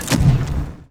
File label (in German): HYDRAU; Best Service; Advanced Mega Effects